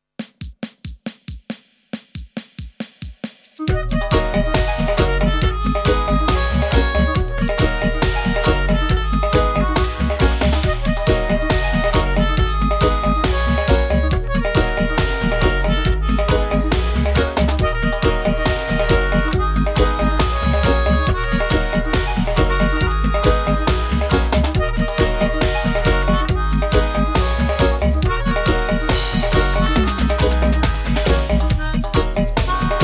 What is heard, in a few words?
melodica